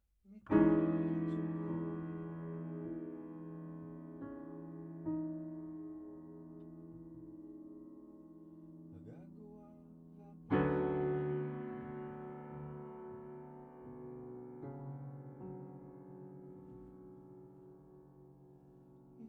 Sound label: Piano Low